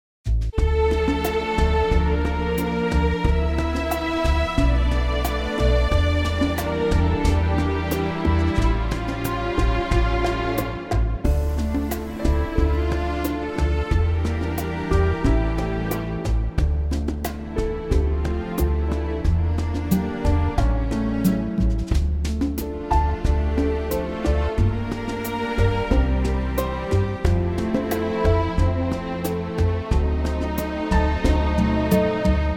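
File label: Dm, Backing Tracks for Male Vocals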